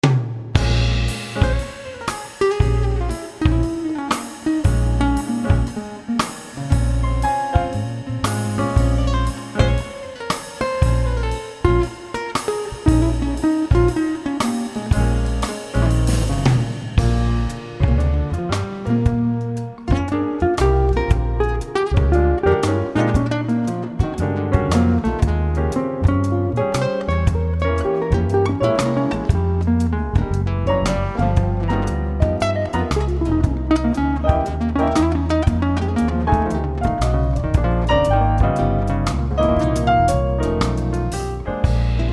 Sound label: jazz blues